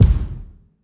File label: rich lows kick single shot a sharp key